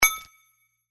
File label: Mining hit